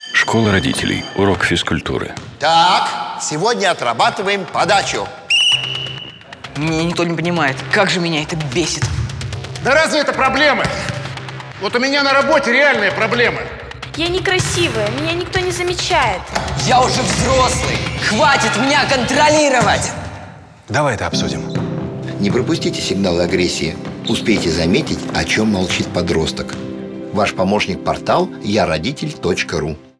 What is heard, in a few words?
Gym radio mix